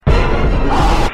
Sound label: Jumpscare; ONAF